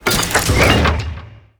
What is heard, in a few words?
reload; gdi